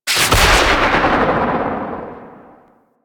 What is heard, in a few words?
Engine Boost; Warframe; Vehicles; Archwing Healer